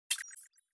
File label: tab click